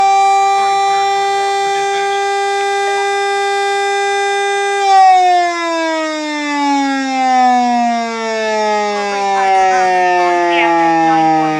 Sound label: Fire Siren